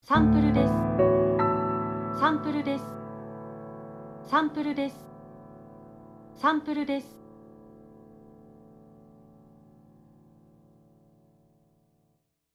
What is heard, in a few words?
Piano fast; Logo